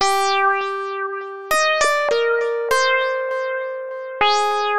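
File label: Leader, loops; лупы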